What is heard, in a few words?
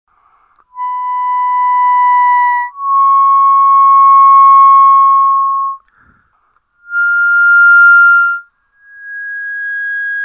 draw to choke to overdraw